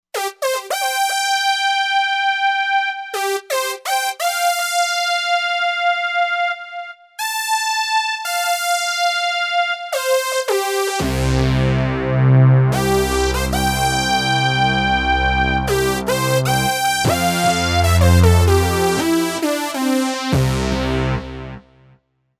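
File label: Brass FANFARE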